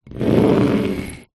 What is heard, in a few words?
foley wood scrape sharp pointed object; zapsplat